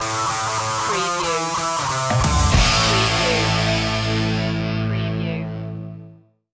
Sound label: fuzzbomb stinger